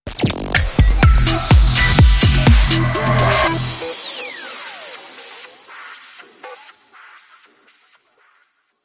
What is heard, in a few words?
Perfect Transition